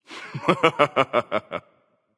laugh

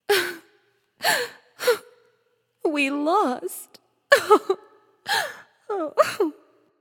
boo hoo; smite; themorrigan

